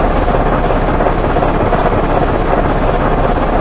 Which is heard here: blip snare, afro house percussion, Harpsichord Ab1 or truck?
truck